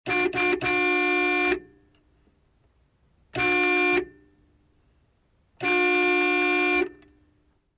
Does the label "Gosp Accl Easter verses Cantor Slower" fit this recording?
No